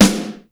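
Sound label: snare rock mute, Yamaha Motif ES drum machine; 300 Drum Machines; Yamaha Motif ES-Series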